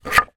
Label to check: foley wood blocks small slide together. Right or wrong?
Right